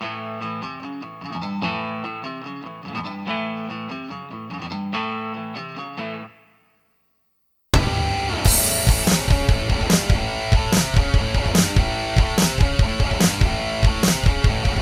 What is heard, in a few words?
harmony karaoke; music smorgasbord